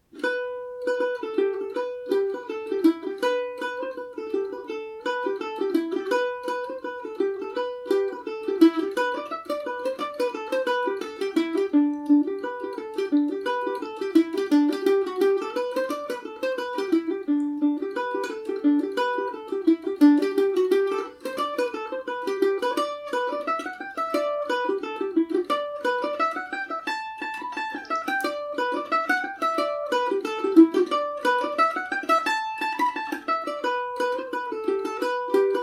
craigs pipes gmaj, mandolin

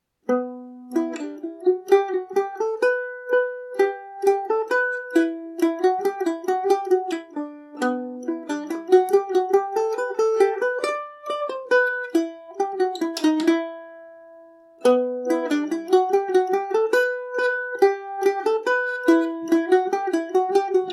E dorian, Irish mandolin; king of the fairies

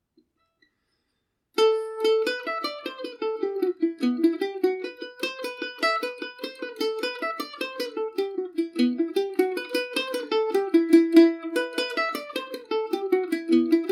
white petticoat gmaj, mandolin; The White Petticoat; Paris Swing “Macaferri” mandolin

